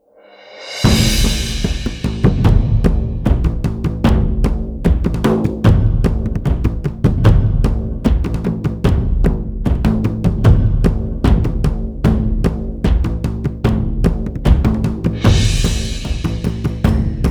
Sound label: Perc Bavarians